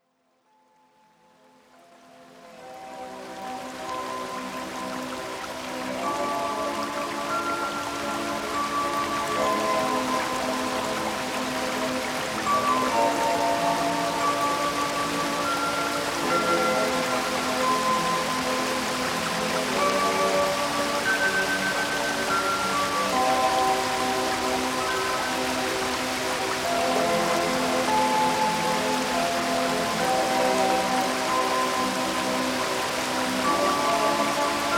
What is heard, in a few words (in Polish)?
gorski potok 432 hz